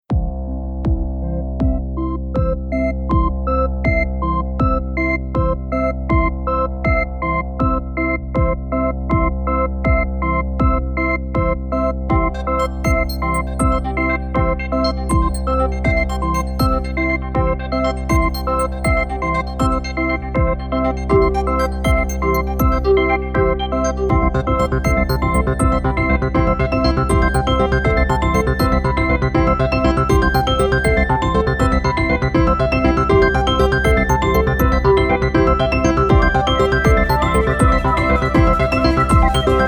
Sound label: Speaking in Arpeggios; Noisebiscuits